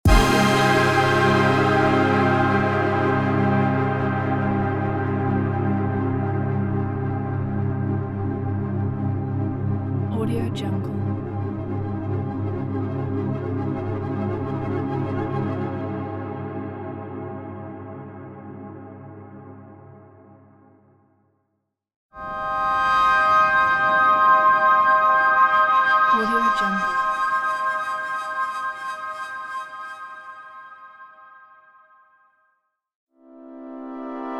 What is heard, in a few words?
epic cinematic transitions